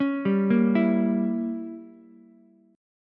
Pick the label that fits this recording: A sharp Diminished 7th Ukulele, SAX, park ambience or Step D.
A sharp Diminished 7th Ukulele